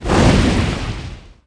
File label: Fire Ball Missile Death; war3source